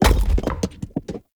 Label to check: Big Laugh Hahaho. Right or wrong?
Wrong